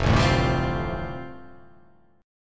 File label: F 6th Add 9th F down, chord